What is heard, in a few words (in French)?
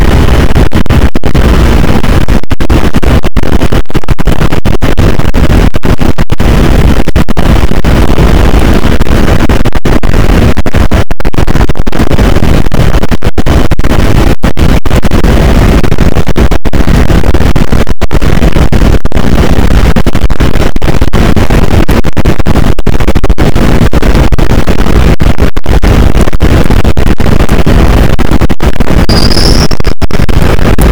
cachalot positif, boat; Physeter Macrocephalus